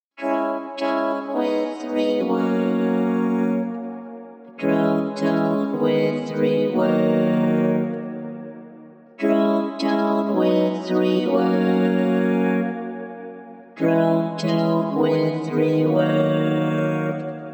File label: EH V256 Drone Reverb Bandsweep